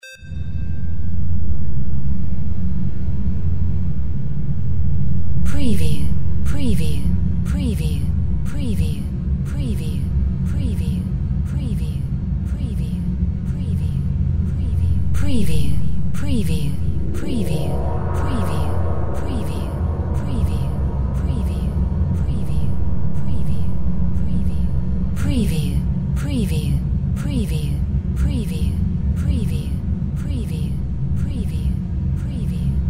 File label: drone brass generator fx